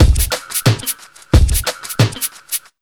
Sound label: 90 BEAT, Pure Electro; USB Soundscan vol.03; AKAI